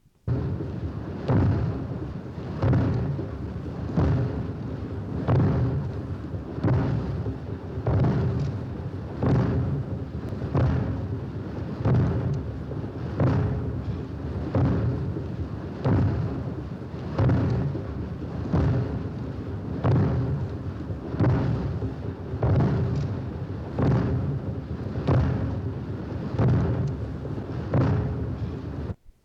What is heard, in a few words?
Huge Drum Or Fantasy Footsteps